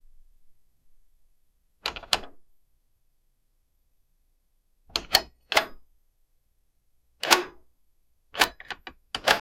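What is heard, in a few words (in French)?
allumer le disjoncteur, electricity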